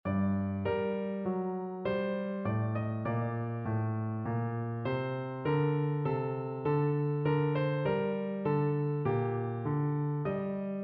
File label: aria PNO